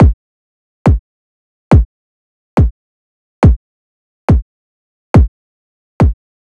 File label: Riddim Drum Loop Kick (140); Drum Loops; Ghosthack; Riddim Essentials 2020